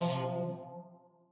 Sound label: warning